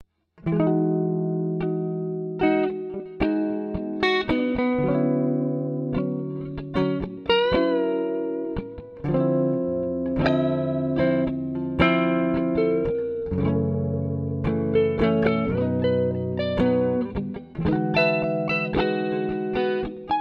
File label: autumn sketch mix